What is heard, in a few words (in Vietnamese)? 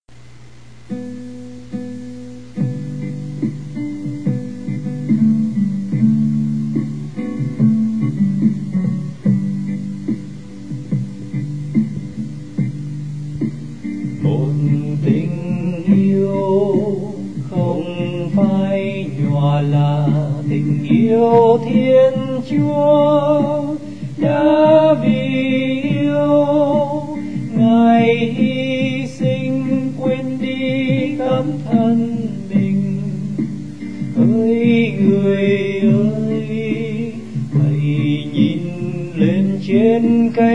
BASS